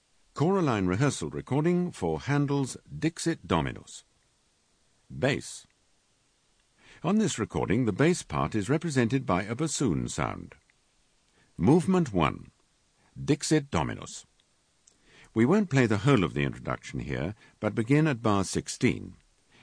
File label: Bass; Handel Dixit Dom